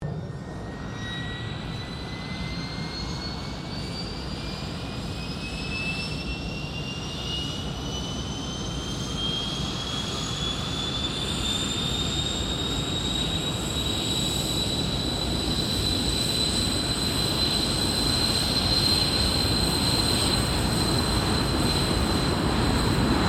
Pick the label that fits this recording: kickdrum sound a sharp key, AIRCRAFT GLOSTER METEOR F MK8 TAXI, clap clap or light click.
AIRCRAFT GLOSTER METEOR F MK8 TAXI